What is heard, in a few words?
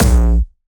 A#1, Jumpstyle Kick; Bassdrums; Jumpstyle Multis; vengeance essential club sounds-3; VEC3 Bassdrums